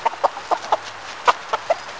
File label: chickens